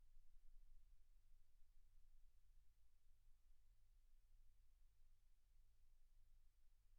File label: silence 48kHz